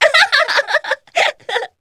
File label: succubus laugh long; queen girl